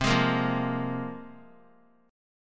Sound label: C 7th Suspended 4th Sharp 5th; C7sus4#5 strummed